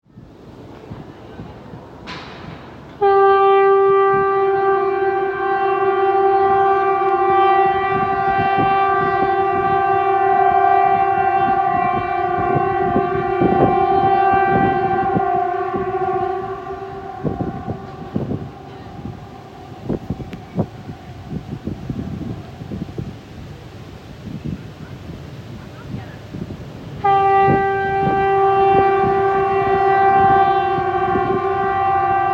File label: Bergen Norway National Alarm Siren; Emergency alert test signal, Bergen, Norway at midday on 12th June 2024; I made this recording recently whilst on tour in Norway. There was a national alarm test